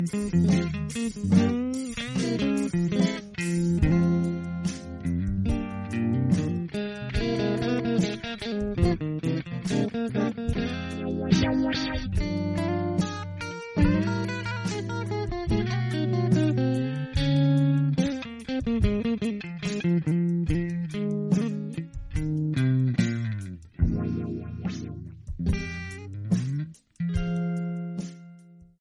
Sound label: guitar ringtone